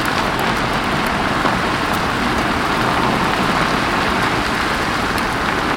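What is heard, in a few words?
car wheels moving on grass loop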